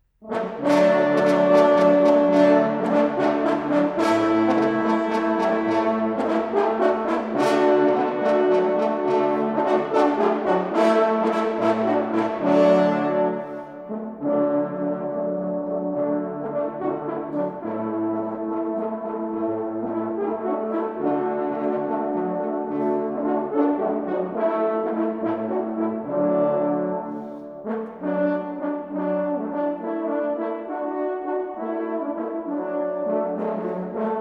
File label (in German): Jagdstück, jachthoorn